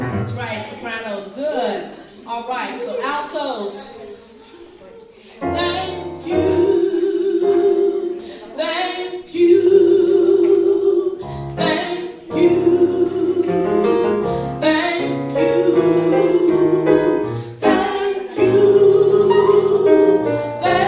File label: alto, mass choir